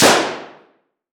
Weapon Gun Machine Gun Thompson Single Shot Interior Shooting Range; AdobeCC